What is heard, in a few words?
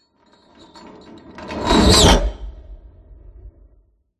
Cinematic Metal Whoosh